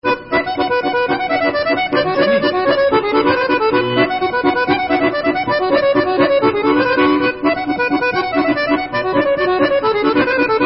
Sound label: Bucimicz, Folk, Instrumental